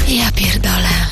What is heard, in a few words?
assasin die; svencoop; underrain